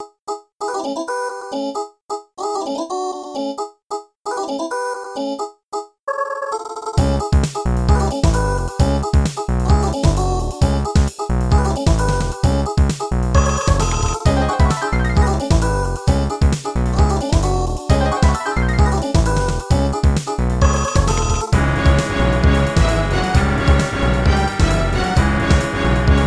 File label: midi battle; spamton; Hidden Music Tracks